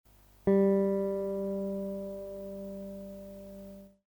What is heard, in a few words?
guitar g; accordeur